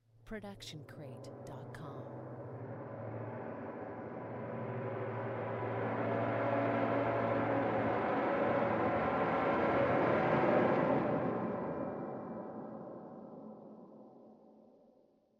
Horror Low Rumble Riser; SoundsCrate

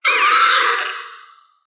raptor roar medium; soundscrate

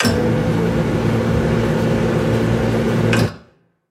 robotic machine short

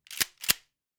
Shotgun Racking; SoundsCrate

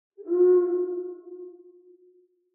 Night Time Animal Call; SoundsCrate

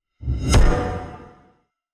Epic Metal Hit; soundscrate

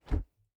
Fighting Woosh; SoundsCrate

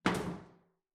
Metal Footfall; soundscrate

